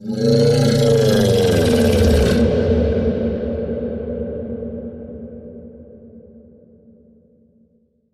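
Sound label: creature growl; King Growl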